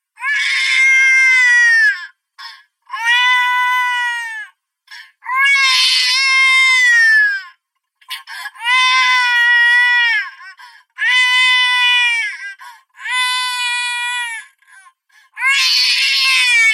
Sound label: crying baby sample